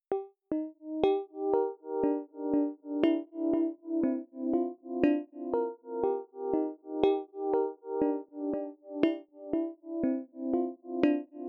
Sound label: yoshimi circle of fifth melody